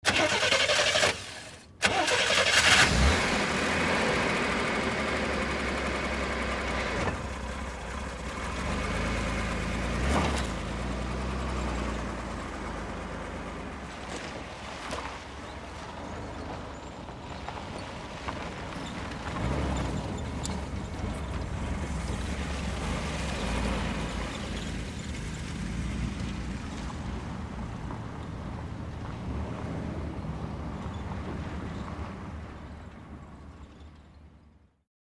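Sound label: download Sound, Chevrolet Corvair, False Start Close Up, Idle, Away Slow Onto Gravel, Return, Away Slow.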